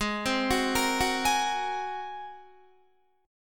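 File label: A flat 9th A flat Guitar, chord; arpeggiated; 16,15,16,15,18,15; 4E,4A,5D,5G,5C,6F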